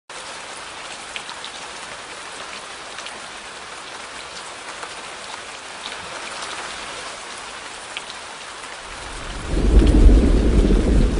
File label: The Sound Of Thunder And Rain no Music